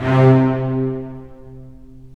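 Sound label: STR, strings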